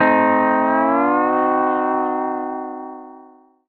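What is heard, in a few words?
pedal steel chord A dim7 R, Country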